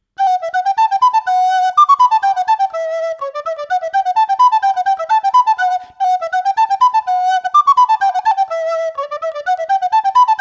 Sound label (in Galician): trad, Pito Galego